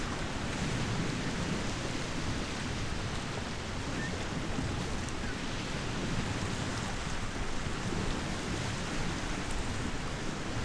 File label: WATER COAST